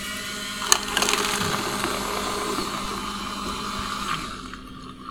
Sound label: machine vend hot drink; civ13; civ14; Machines